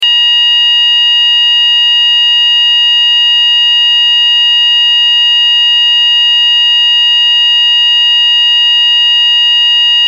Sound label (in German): Ton